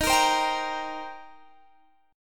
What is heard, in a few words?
Eb 6th Eb down Guitar Standard